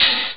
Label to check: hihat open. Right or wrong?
Right